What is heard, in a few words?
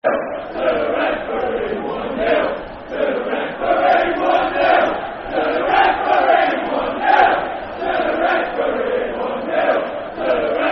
Football Chant, Soccer Song; 1 Nil To The Referee; Peterborough United Pufc